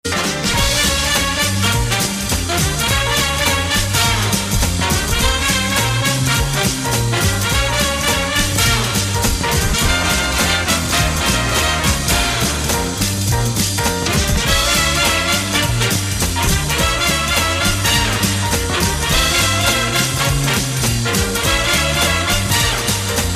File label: swing jazz